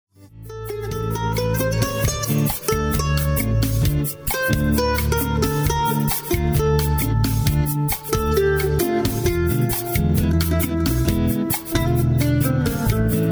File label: latin dinner music